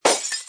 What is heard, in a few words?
glass; merge-dragons-2